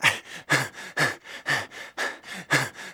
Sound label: male out of breath; Project Zomboid v41.73